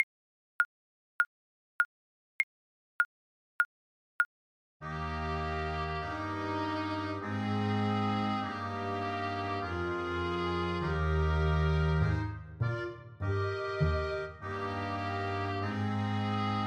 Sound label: twinkle twinkle, Tuba